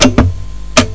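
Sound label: cassette tape eject; Sharp X1; Neo Kobe emulator pack; Xmil106R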